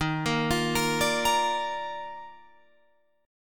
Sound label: Eb Major 7th Suspended 2nd Eb Guitar Open F; arpeggiated; 15,17,17,17,17,17; EbM7sus2